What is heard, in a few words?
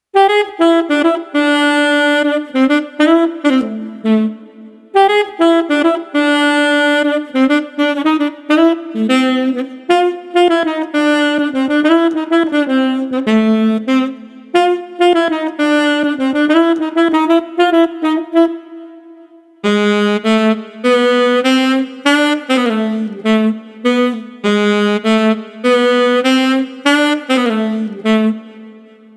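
Street Tenor Sax Jubilant, 100 bpm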